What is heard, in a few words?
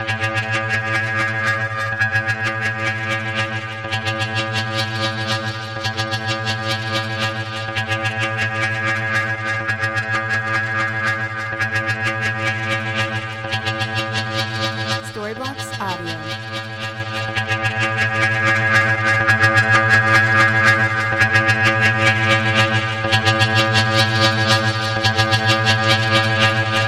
Ambient House Riser 125 BPM A